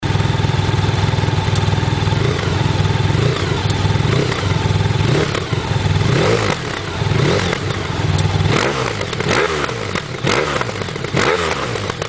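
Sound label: Honda VFR1200F, Auspuffanlagen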